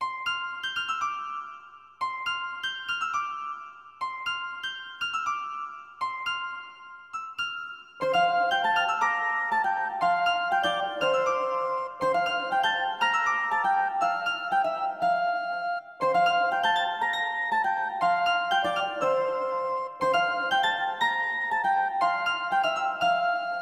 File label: Irish Lass Celtic